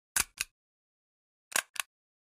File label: staple